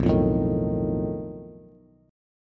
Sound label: B 7th Flat 5th B Bass; B7b5 strummed; JGuitar